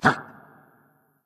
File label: hitreact human male small